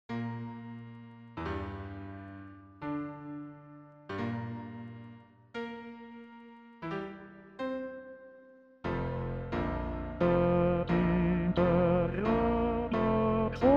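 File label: soprano; Rossini; solonnelle; laudate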